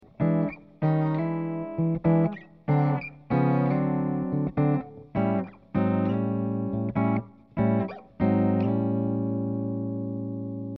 Finger Rhythm

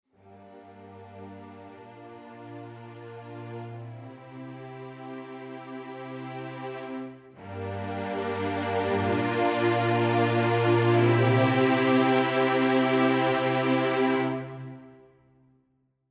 Full Ens Sustained Close Mic; Audio Imperia Areia

